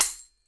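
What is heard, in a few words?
PERCUSSION TAMBOURINE; Sonic Reality; Interactive Drum Kits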